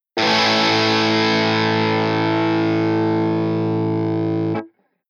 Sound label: Distortion Over Drive Dist